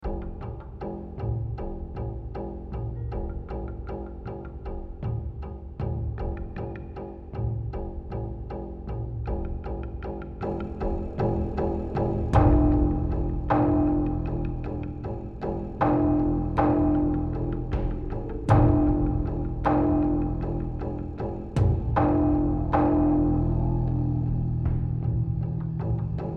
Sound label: Rage Combat; The Last of Us